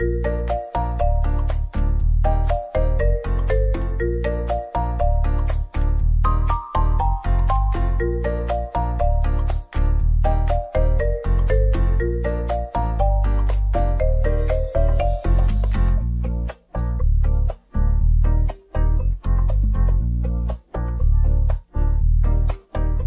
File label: Instrumental